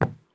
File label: run wood; Escape from Tarkov